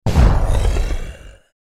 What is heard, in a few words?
Monster Snarl Growl Creature; Horror; Monster Sound Effects; Animal